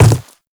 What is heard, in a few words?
Infested Footsteps Quadruped Medium Walk Dirt; Warframe